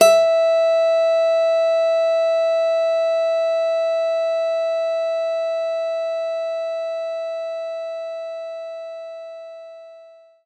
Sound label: Nylon Guitar E4; knights try